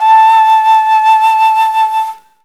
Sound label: D.FLUTE; E-Lab; X Static Goldmine 2; akai